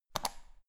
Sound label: power button turn on off hard quick